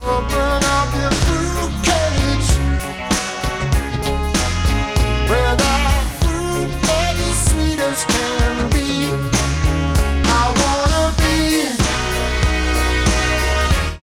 Celemony Melodyne pitch shift

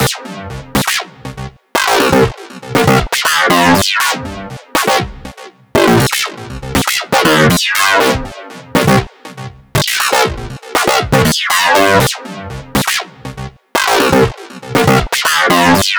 envelope follower synth line; universal audio

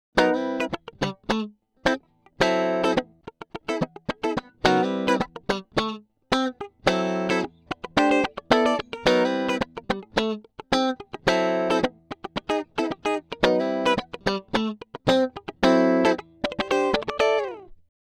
electrik raw; tc electronic sk48

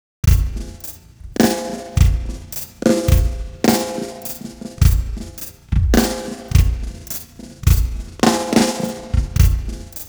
drums 200; i3 dsp quattro x; timestretch

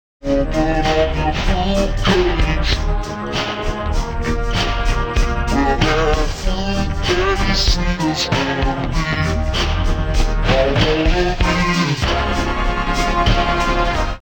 IK SampleTank pitch shift 12

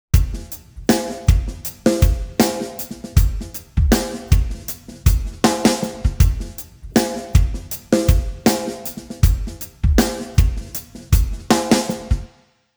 drums 133; digidesign pro tools xform; timestretch

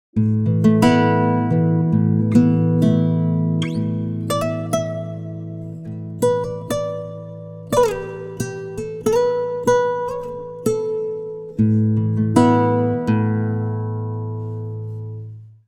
timestretch guitar; prosoniq time factory mpex3

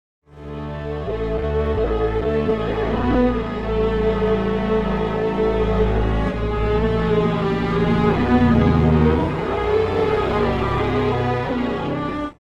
strings; timestretch; ikm sampletank stretch

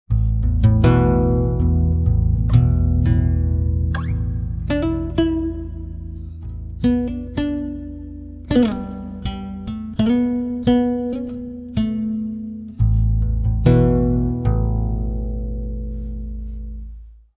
guitar, pitchshift; serato pitch n time le

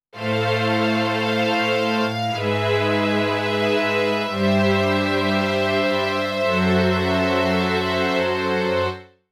strings sec wide sample, Clavia Nord Wave

